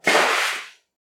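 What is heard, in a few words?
splash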